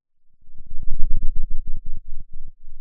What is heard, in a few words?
pop, Sound of a thunderstorm